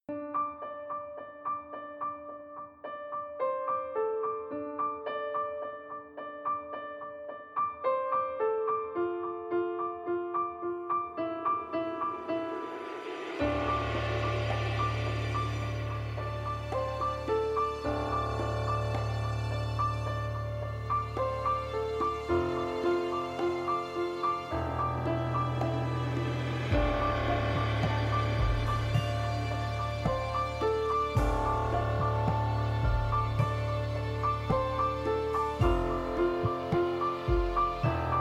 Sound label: sad song; PhoenixCraft-1.16.5; ambience music